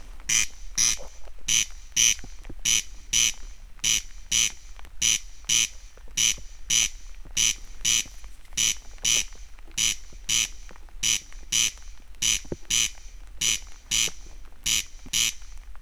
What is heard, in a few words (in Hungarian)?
szóló csepegő eső, haris; Teresztenye